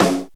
dark steel snare drum c sharp key

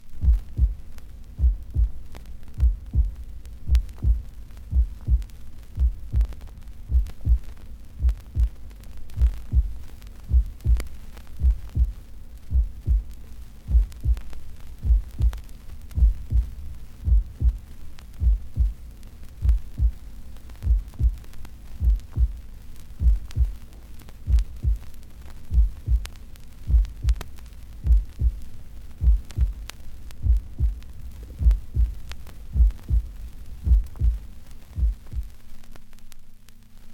human heartbeats, vinyl

